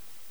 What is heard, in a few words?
silence